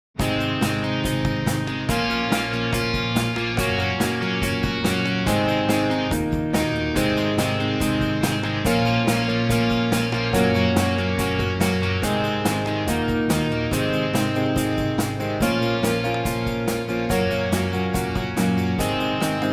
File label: Classic Rock Exciting; amperAI